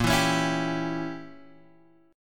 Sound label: Bb Diminished 7th Bb Guitar Open F; strummed; JGuitar